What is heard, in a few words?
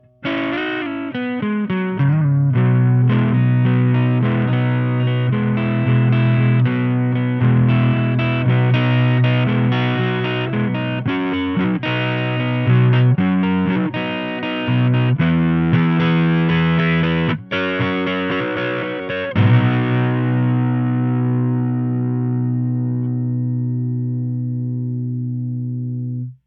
ginger, Fender Jazz Bass (both pickups) - Ginger - Fender RAD Bass SS amplifier with EQ controls at 12 - mic - mixer - sound card; neck pickup - Gain 12, Bass 10, Treble 13